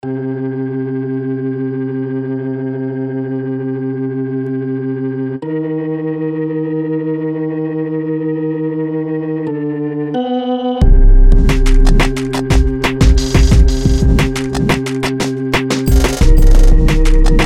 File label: Sci Drama Loading Beat